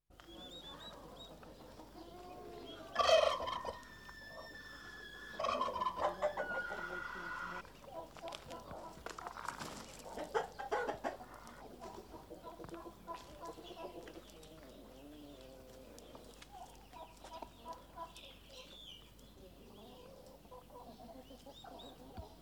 POLLAIO AIA CORTILE

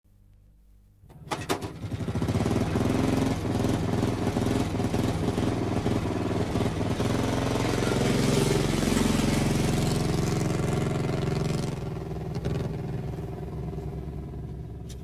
MOTOCICLETTA PARTENZA

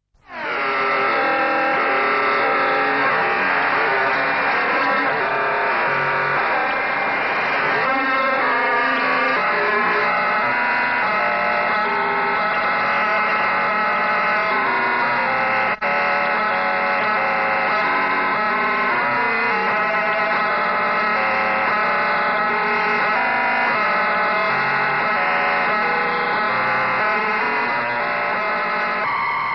EFFETTO ELETTRONICO

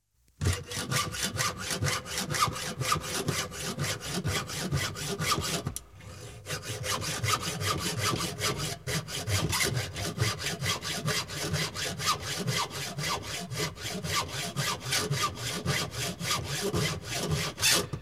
SEGA A MANO FALEGNAME

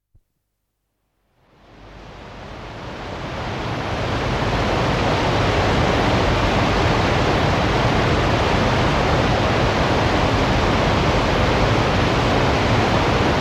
FABBRICA

